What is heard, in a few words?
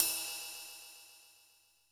Ride Cymbal, Standard Kit, Yamaha PSR-E323